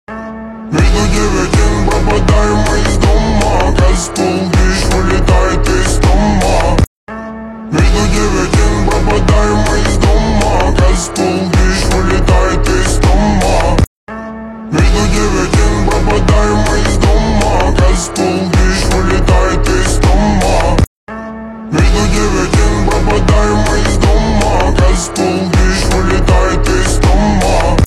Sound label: slowed